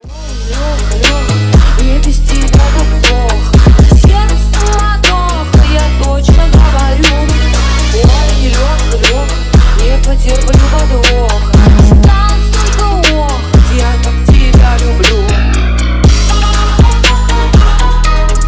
phonk remix

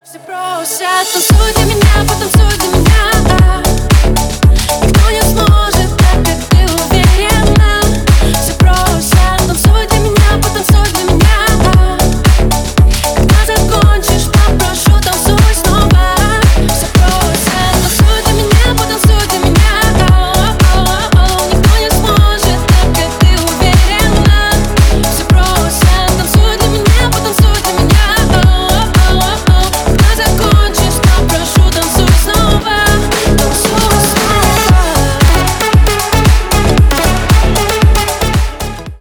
remix

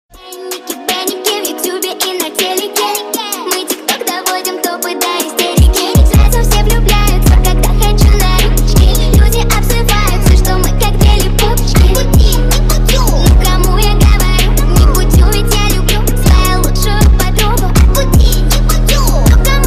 phonk remix

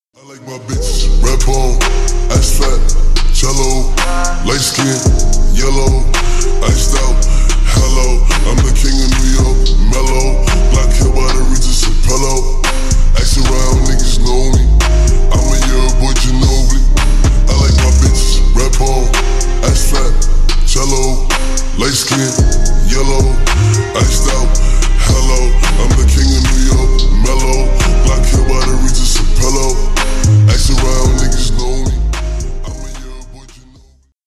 slowed reverb

